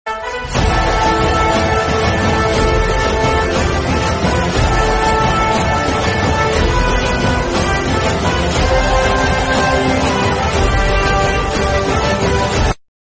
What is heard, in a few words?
gaming, bgm, theme; pubg